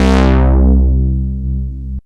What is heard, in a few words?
BASSITY, OBERHEIM; Rubber Chicken Software Sample Bank